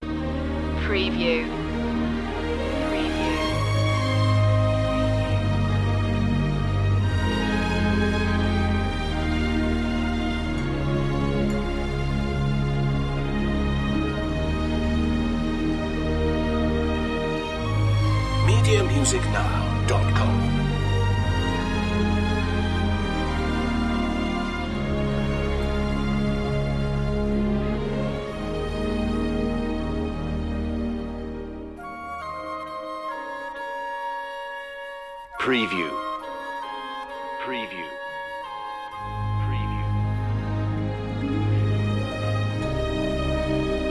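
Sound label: climax no piano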